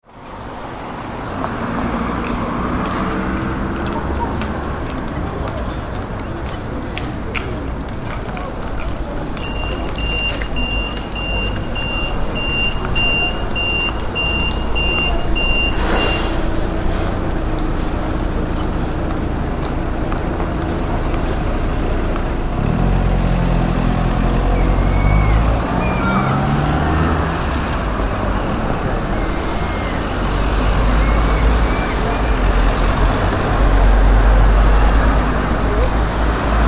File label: Street Crossing, Busy street with pedestrian crossing bleeps.; Traffic and Cars; Backgrounds